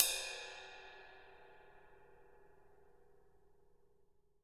ride

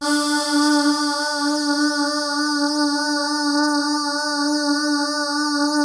PPG CULT D3; Music Store - Claudius Diemeter Schwerlos; DIG MYSTICS

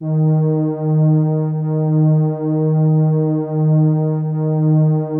warm strings D3, synth strings; Music Store - Claudius Diemeter Schwerlos

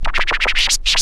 Scratch Fill; Multisamples; Mo' Phatt; Digital Sound Factory Mo' Phatt

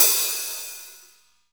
HAT SLOSH; 90s sample CD

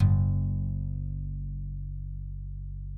AC BASS G; Sonic Reality; American Heartland

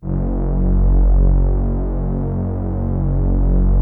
Prophet 5 F#2, Rare Synthesizer; Hollywood Edge - Giorgio Moroder Rare Synthesizer Collection

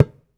Talk Drums; Spectrasonics; Supreme Beats; African Contemporary

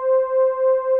ANAPAD C5, ANALOG PAD; Music Store - Claudius Diemeter Schwerlos

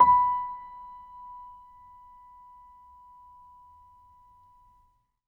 L, Acoustic Piano, PIANO STEREO; InVision Interactive; Miroslav Vitous

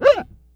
SCRATCH, Bass; EFX SCRATCHS

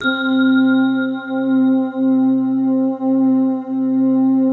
BELL C3; Music Store - Claudius Diemeter Schwerlos; FINE ART 5

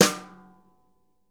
BRSNR, drum

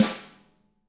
hit; ILIO; Double Platinum Drums 1; DW

